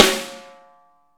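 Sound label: snare ambient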